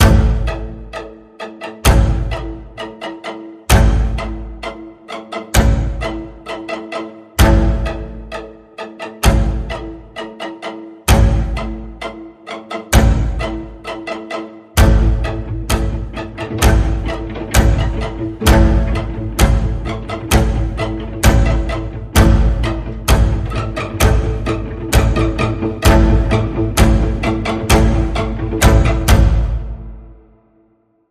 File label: Sinister String Pulse A, Key of A